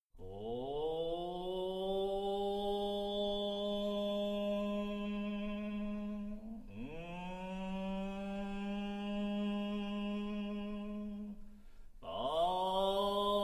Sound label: Shomyo